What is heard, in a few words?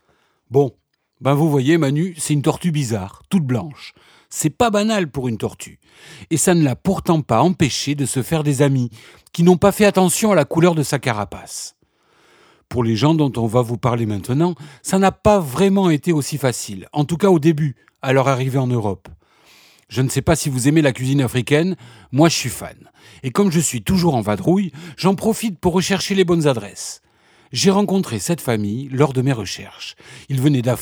transition